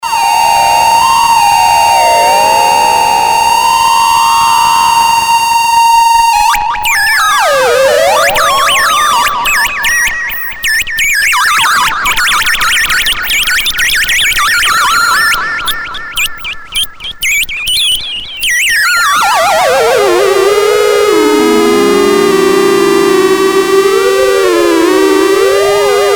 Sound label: delay